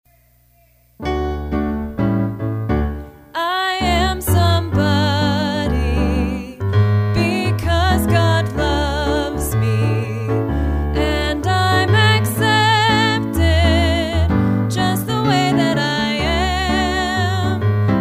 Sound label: vocal; PSE Music